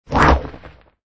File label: center Fly